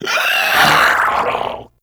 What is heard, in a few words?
Infested Runner Explosion Warning; Warframe; Runners